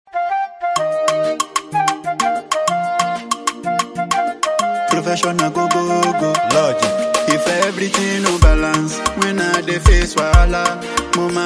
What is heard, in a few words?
Professional Beat